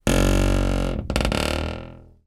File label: household door creak wooden hollow